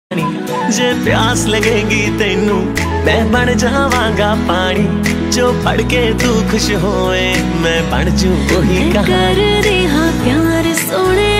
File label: Punjabi Song Ringtone